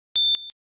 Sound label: galaxy watch beep